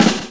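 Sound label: drum roll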